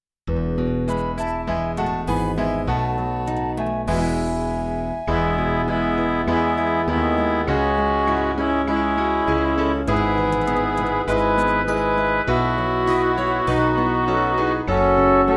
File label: midi